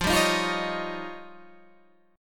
F 7th Suspended 2nd Sharp 5th

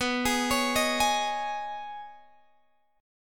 B 6th Add 9th B down Guitar Open G

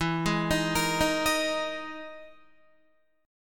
E-Major Sharp 11th E, Guitar; EM#11 arpeggiated; 11,11,15,15,15,11; JGuitar

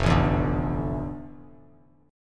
F Major 13th Bass Standard; JGuitar

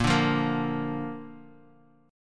Bb Major Bb down, chord; strummed; JGuitar

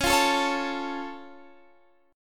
C sharp Augmented C sharp Guitar Half Step Down

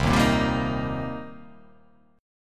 C Minor 7th C down

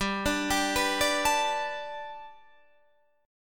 G Major Add 9th Guitar Open D, chord

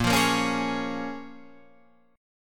Db 6th

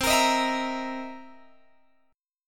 C 7th Sharp 9th C Guitar Open G

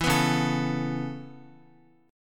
Db Minor 7th Sharp 5th, Guitar, Dbm7#5 chord; strummed; JGuitar

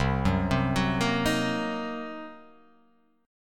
C Minor 7th Flat 5th, chord

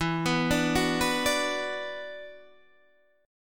E 7th Suspended 2nd, down, Guitar, Open D

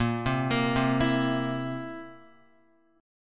A sharp Suspended 2nd Flat 5th, Guitar; arpeggiated; guitar chord

